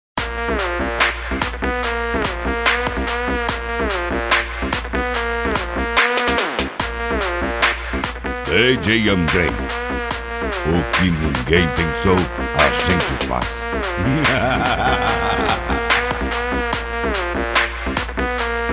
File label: pancadao funk bass